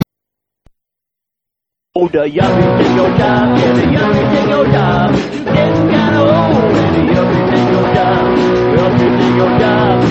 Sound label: blues